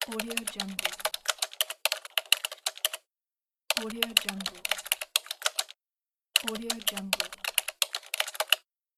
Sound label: the typing keyboard